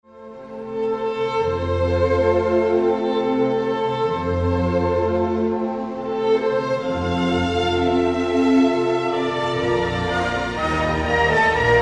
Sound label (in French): Medley Classique Lent